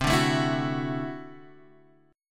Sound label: D 9th C down Guitar Standard; strummed; JGuitar